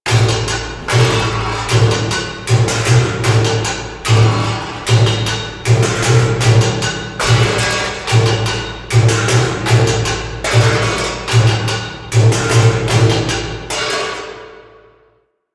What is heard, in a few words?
Music Exterior, Ambience; Vent Kids Skeg; Warframe; Venus Open World; Venus Solaris United